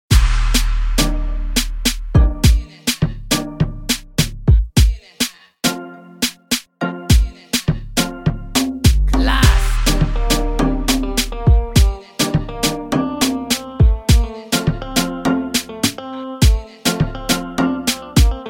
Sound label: Instrumental